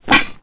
Wall Grab Metal Foley; Warframe; Characters; Tenno

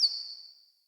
Cardinal Female Bird Single Chirp

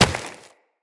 Run Rock, Footsteps; Characters; Tenno

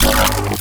Tazicor Updated Shot; Warframe; Moa Pet; Weapons

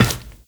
Run Glass, Footsteps; Warframe; Tenno

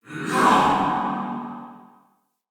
Ghost Soldier Attack, Vocals; Enemy; Wandering Ghost Soldier

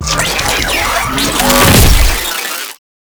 Spin Flesh; Enemies; Abilities; Warframe; Proto Infested Ancient

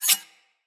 Sword Slice Accent; Weapons; Garren Sword

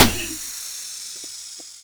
Car Tire Pop; Warframe; Nineteen Ninety Nine

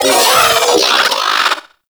Riot Moa Vocal Death; Enemies; Warframe

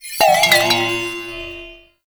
Follie Enemy Movement; Warframe

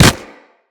Kubrow Run Snow, Footsteps

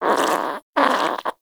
Vocal Snore, Hunting Critters; Characters; Warframe; Nexifera

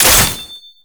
AK47 Last Shot; Warframe; Tenno

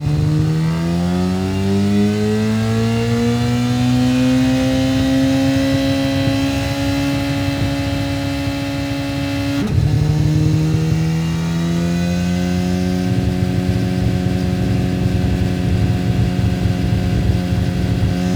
Engine Start Moving, Motorcycle; Warframe; Vehicles; Motorcycle Ninety Nine

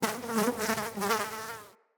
Insect Fly Buzz Close

